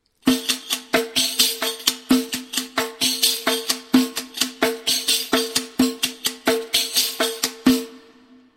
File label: riq